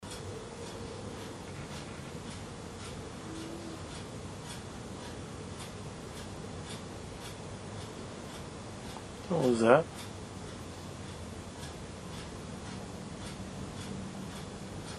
woman moan; Gardner’s Used Books & Music; Tulsa, Oklahoma

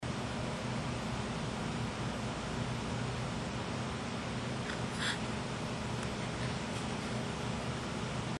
breath recorder kitchen; Gardner’s Used Books & Music; Tulsa, Oklahoma

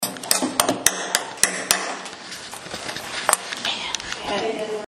banging, 4th floor, sanitorium; recorder

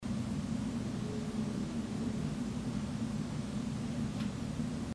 humming childrens area; Gardner’s Used Books & Music; Tulsa, Oklahoma

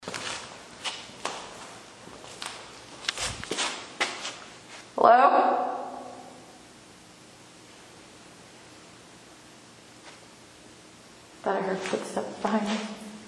5th floor two sets of footsteps

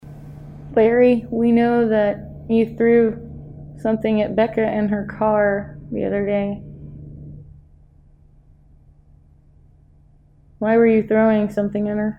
Laughing Reply in building

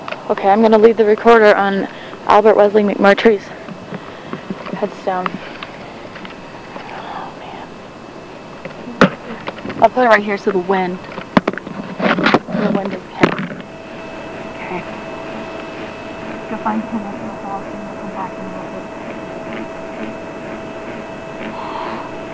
sigh

